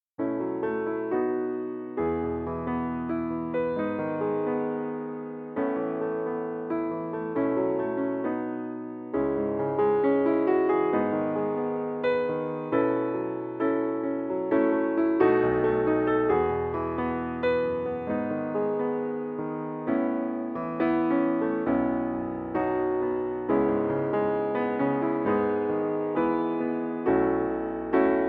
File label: Accomp; Ariel; Mickey Wndr Book